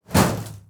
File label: wood door slam